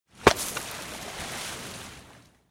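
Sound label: howler monkey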